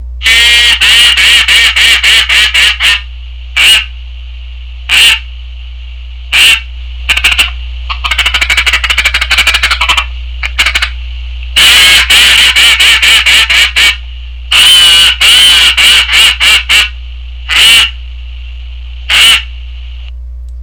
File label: duck call